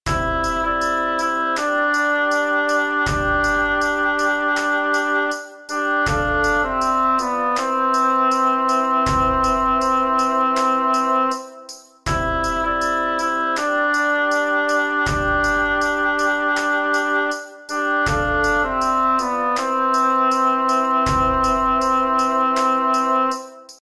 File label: tenor refrain